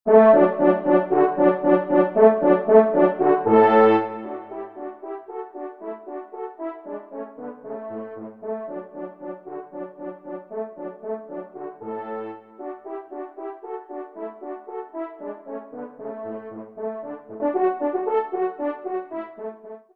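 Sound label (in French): duo, ensemble, trompe cors; Kling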